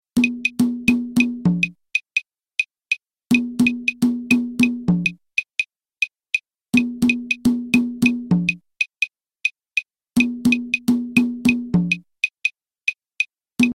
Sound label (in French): guarapachangueo cajon clave